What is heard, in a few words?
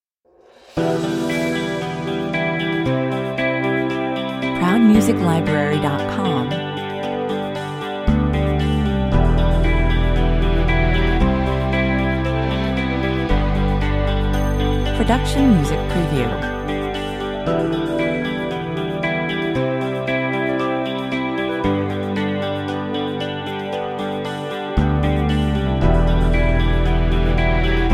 sudden realization 115 bpm